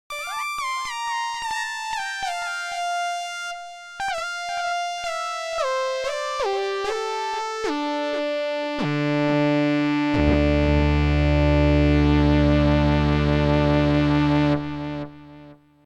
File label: Z1 Samples Lead Saw Classical